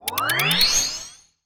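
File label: Sound Effect Special Time addition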